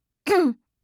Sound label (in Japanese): sekibarai; serifu others